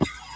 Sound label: breath out; Neverhood